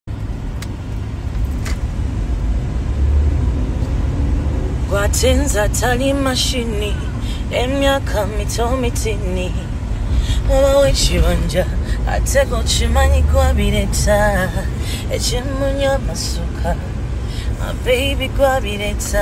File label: Acapella